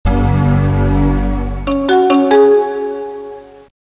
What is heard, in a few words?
Macintel Startup